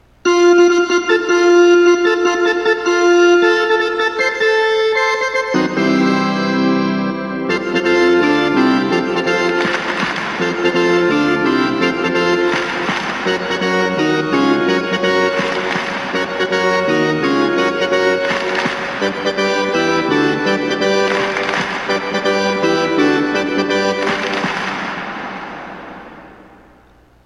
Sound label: Organ Clap Clap